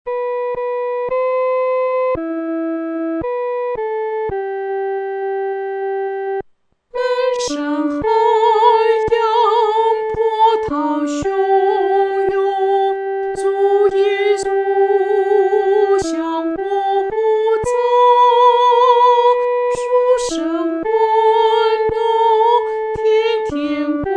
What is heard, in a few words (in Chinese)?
独唱（第一声）